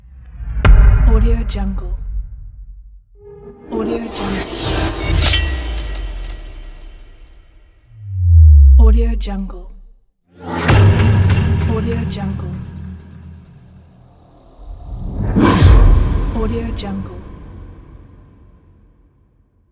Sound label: massive impact